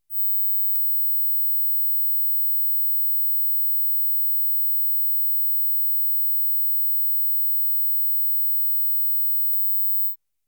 16KHz測試訊號; 雨果金碟

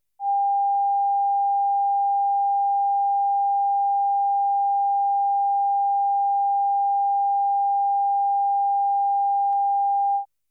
800Hz 測試訊號; 雨果金碟

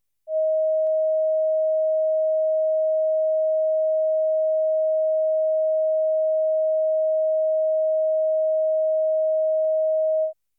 630Hz 測試訊號; 雨果金碟

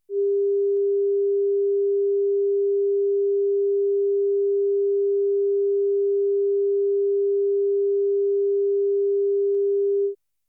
400Hz測試訊號; 雨果金碟

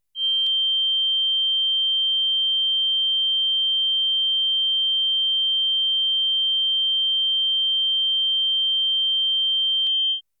3.15KHz 測試訊號; 雨果金碟

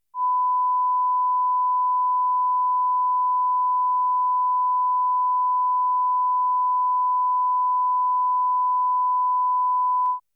1KHz 測試訊號; 雨果金碟

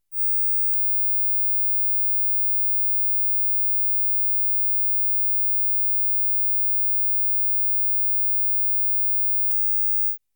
20KHz測試訊號; 雨果金碟

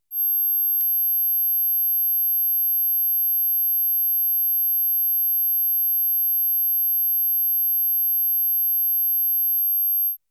12.5KHz 測試訊號; 雨果金碟

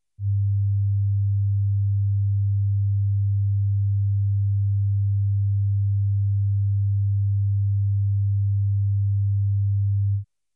100Hz測試訊號; 雨果金碟

